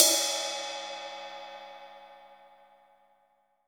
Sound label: M16 RIDE; AKAI S6000 CD-ROM - Volume 3; Ride Cymbal